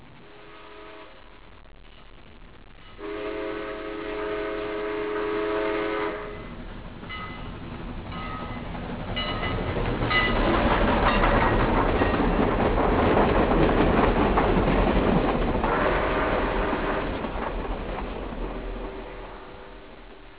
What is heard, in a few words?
train; voyager